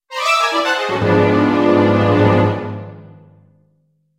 violent, jingle

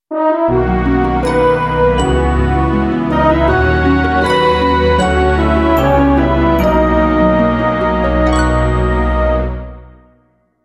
gentle, jingle

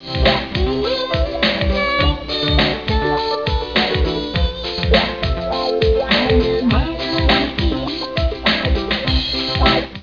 melody

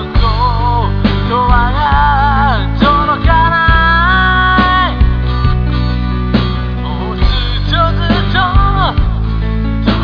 beats

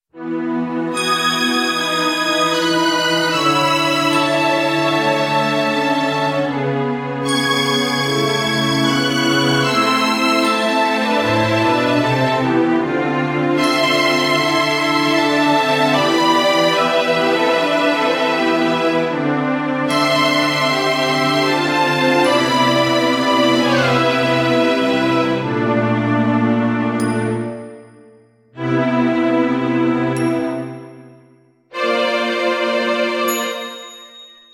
dungeon

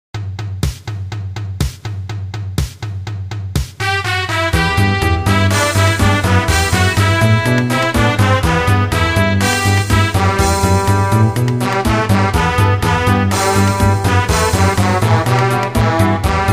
corridor music

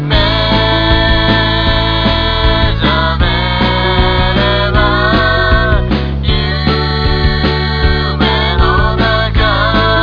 beats

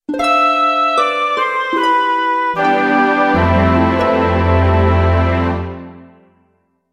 sad, jingle

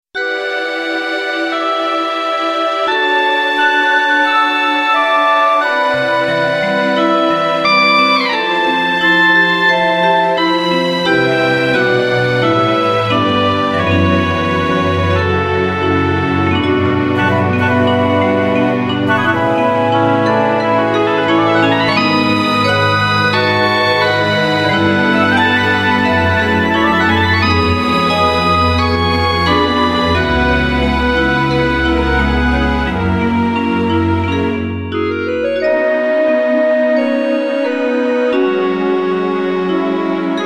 dungeon

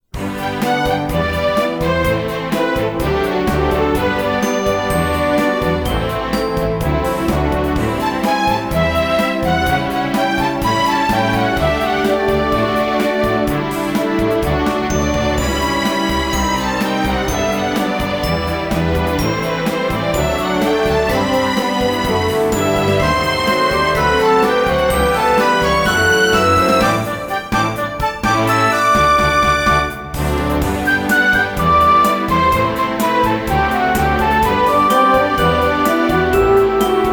town; 町の音楽C; 賑やか・ポップ・現代的; 1分ループ; 町の音楽D

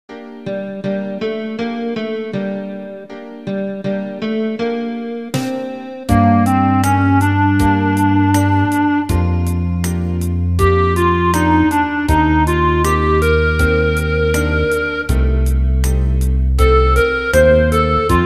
sitting at piano

